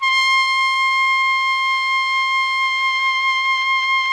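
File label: TPT, trumpet; Roland - LA Composer vol. 3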